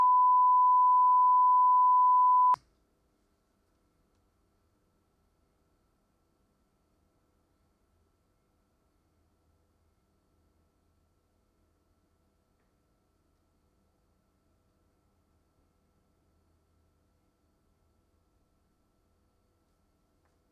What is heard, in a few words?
mixpre xlr noisepro mic; bmcc